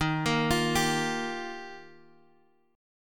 D sharp Major Add 9th D sharp Guitar Drop D, chord; 13,13,15,12,x,x; chordshape